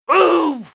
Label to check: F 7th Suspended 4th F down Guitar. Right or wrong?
Wrong